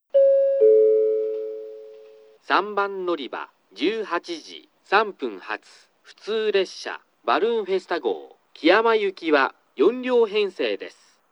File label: balloon